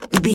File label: lever turn push crank handle small